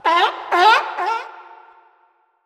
death seal; zoochosis; deep sea; lazynap; sea lion monster

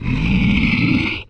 zombie attack scream; The Monster Plane; kolmods preatt

